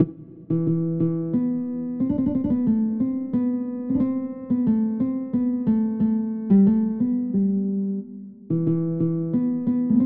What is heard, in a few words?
santoor